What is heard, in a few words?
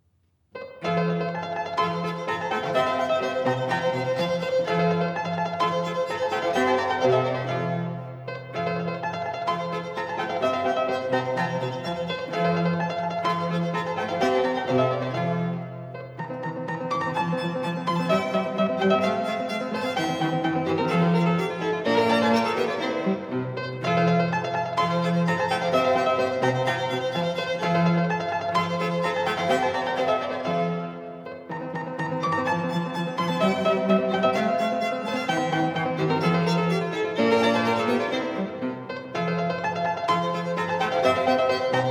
piano trio scherzo; joseph haydn; five arrangements for piano trio hob xv 39 13